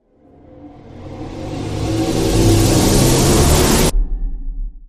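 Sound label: Reverse Clean Sweep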